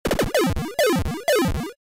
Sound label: Score points in game, امتیاز گرفتن در بازی